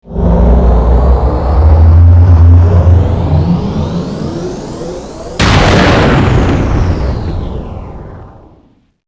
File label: warp out; Xcom; SpaceFlight